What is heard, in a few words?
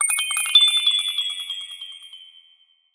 Notification; Samsung Galaxy Tab S8 Plus